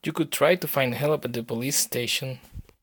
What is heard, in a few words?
guitarist; DeadFright